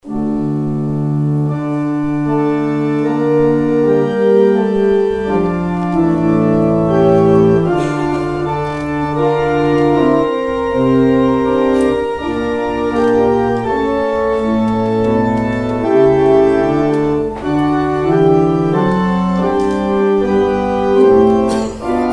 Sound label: organ outro; exit music